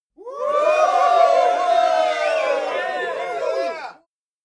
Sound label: cheer happy short